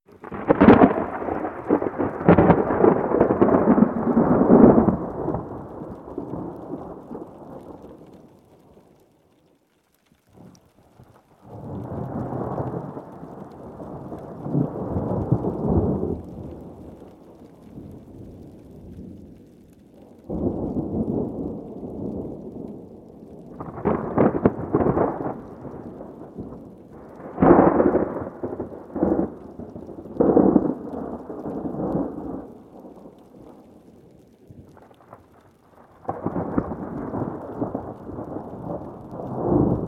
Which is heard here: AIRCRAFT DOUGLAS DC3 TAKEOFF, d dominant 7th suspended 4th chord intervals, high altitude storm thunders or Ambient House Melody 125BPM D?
high altitude storm thunders